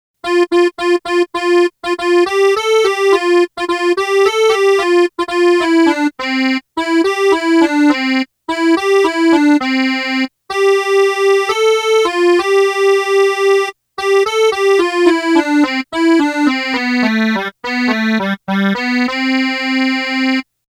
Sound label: melody